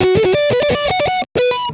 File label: harmony; iVoca